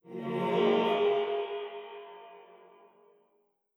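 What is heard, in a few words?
Dracosoldier Shields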